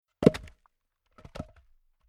Domestic Throwing Large Log Onto Log Pile Wood Impact And Roll; Miscellaneous Impact